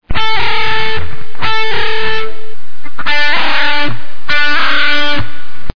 Music Flicks

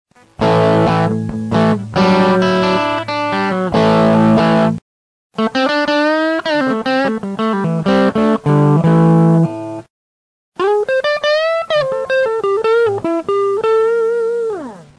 Music Crunch

